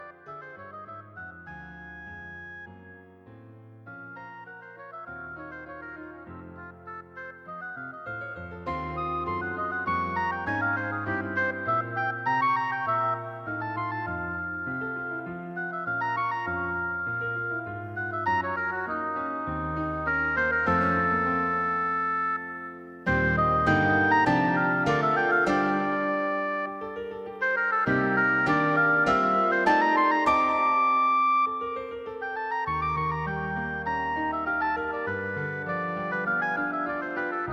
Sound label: Oboe; Oboe and Piano